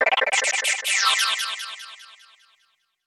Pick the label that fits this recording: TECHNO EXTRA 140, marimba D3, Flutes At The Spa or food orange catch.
TECHNO EXTRA 140